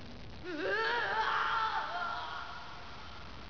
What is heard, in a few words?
agony, japanese